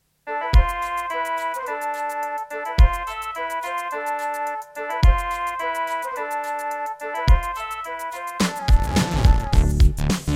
Miditemp DS-48 CleanWave32; Lost Vikings

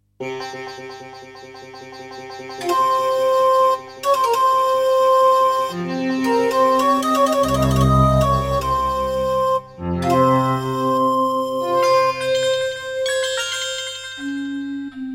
Intro, Terratec MiniWaveSystem WT64; Prince of Persia 2 - Intro

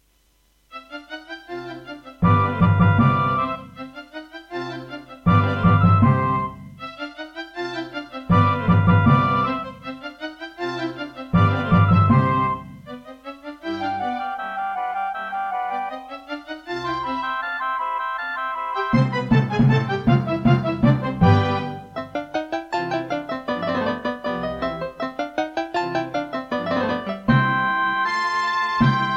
Mozart Concerto K467 partial, Serdaco E-Wave; classical

